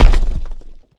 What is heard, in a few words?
large rock impact